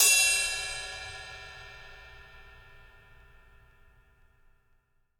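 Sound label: ride bell, drum kits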